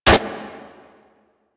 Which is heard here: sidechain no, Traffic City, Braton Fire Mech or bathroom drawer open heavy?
Braton Fire Mech